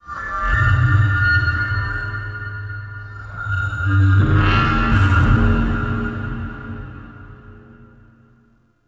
Tech Texture Large; Warframe; Ambience; Cephalon Weave

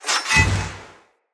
Sword Whoosh Accent Heavy; Weapons; Player; Swords; Fenn Jotar Sword

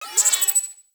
Rail Jack Tech One Off

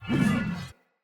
Great Sword Pre Whoosh; Weapons; Swords; Dendrit Ara Great Sword; Accents

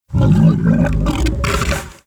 Idle Stomach Rumble; Liset Alchemy Lab; Helminth

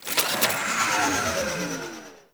Drone Return; Warframe; Deimos; Cephalon Drone

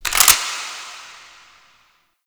Grineer Gun Cock; Warframe; Tutorial; Cinematics

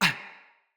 Pain, Battle Barks; Envoy

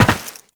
Land Metal, Footsteps; Warframe; Tenno

